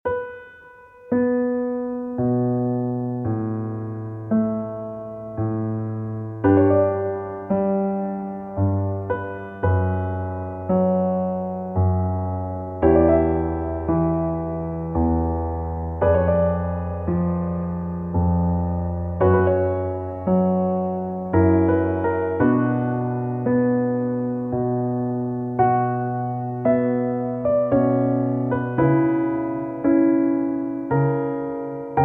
Orchestra, Adagio in G minor; From Phamtom of the Opera

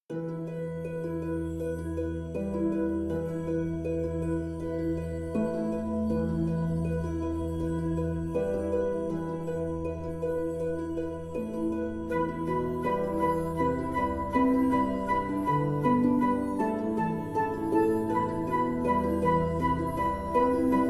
Instrumental Version

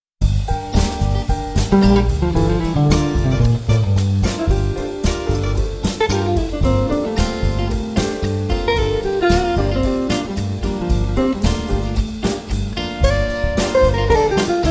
Mantra Jazz

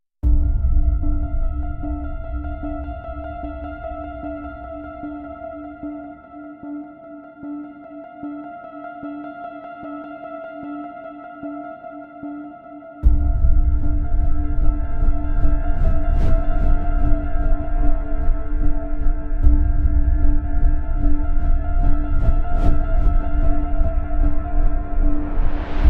No Solo - No Choir - No Vocals

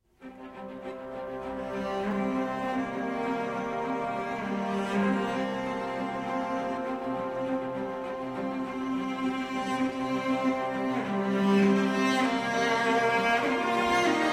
Orchestral

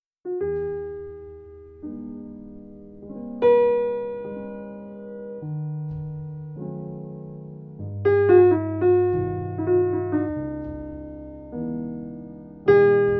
Sleep Jazz

